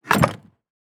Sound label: Item Wooden Chest Open or Close; Game Essentials